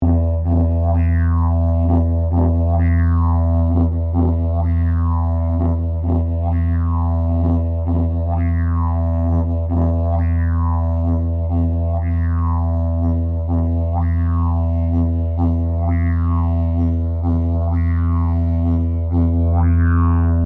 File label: Didgeridoo Traditional Tapered Rhythmic Drone Loop, Traditional Australian; Traditional Australian Aborigine didgeridoo designs; Musical